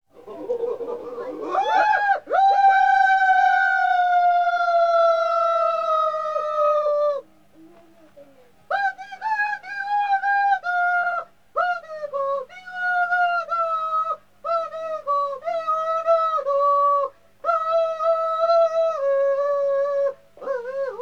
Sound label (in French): haut xingu yawalapiti chant de danse kozi-kozi; bre sil